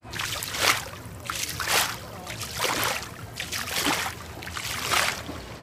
Oaring Swimming

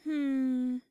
Hmm

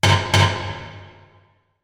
Gavel

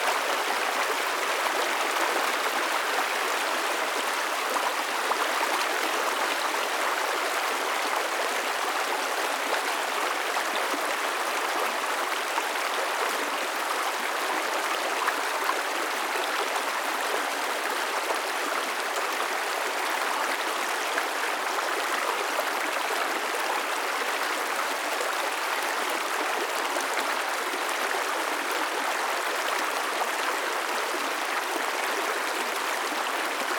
Small River Fast Close

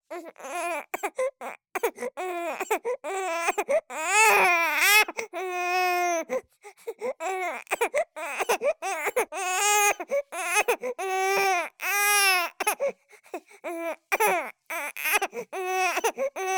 Voice Baby Cry Sequence Long Mono

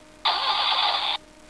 Toy car start

